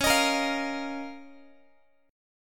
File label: Db 6th Add 9th Db, down, Guitar; Db6add9; strummed; tuning=4A,4E,5A,5D,5G#,6C#